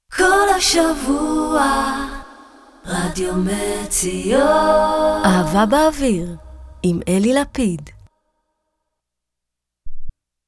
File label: slow a capella; Kol HaShavua